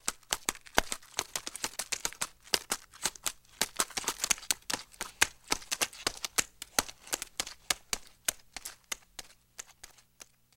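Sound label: Dog Run On Solid Surface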